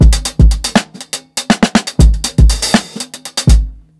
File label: 120 16TH GROOVE, DRUMS LOOPS; BASEMENT DRUMS VOL 2